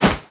move throw; scripted sequences, a1, safehouse

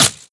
flavor default, bullet impacts; physics; Half Life Model Pack

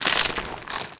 zombie move, foley; Half Life Model Pack

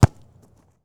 ball impact, rubber; physics

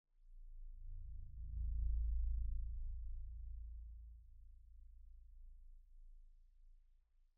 cita swell d3, music, ambient

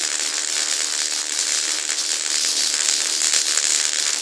electric loop, energy

